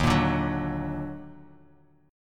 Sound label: Eb Minor Major 7th Eb down, chord; EbmM7; strummed; JGuitar